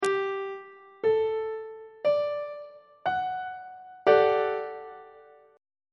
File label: f double sharp major 7th suspended 2nd chord on treble clef